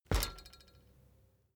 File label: bicycle street bump front